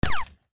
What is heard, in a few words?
wall, Guns hit